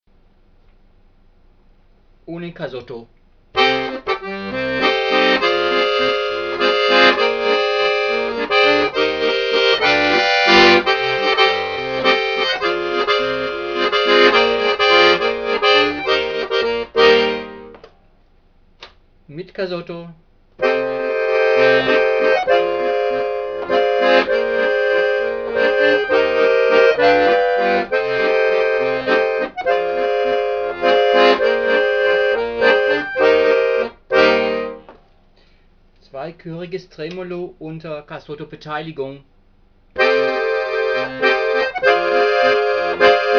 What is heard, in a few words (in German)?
cassotto, akkordeon